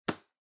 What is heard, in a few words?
Hand Foley Pat Strong